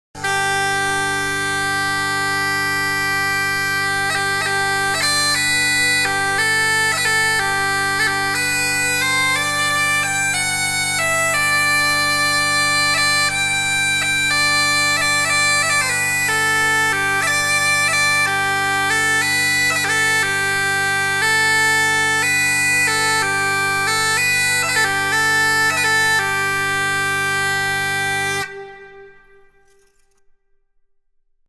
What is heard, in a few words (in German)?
Kleiner Marktsack, Dudelsack